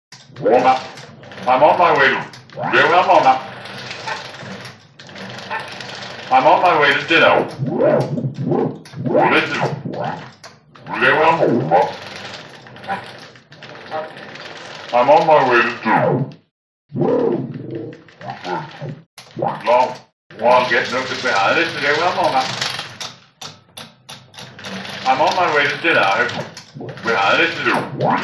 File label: Moviola Running Dial, Moviola Running Dialogue